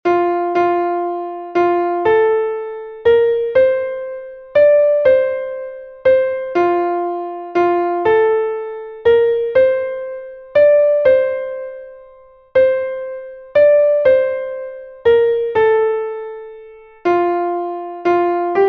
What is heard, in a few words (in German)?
klavier; MelodieMeister